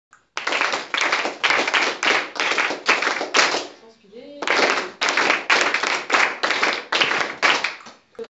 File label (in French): claps